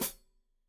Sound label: Hat Foot, Hi-Hat, Cymbals Stereo